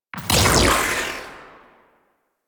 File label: Med Charged Tail; Warframe; Weapons; Corpus